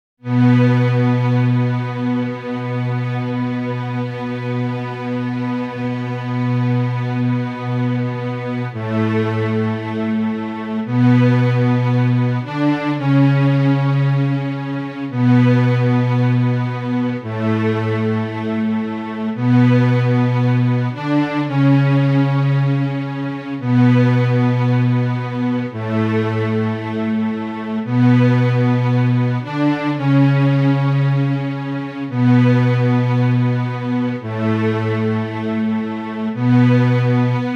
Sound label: Strings